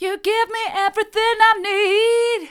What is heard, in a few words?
mid, vocal